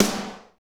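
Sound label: snare, Techno Rock; Northstar; Drumscapes Roland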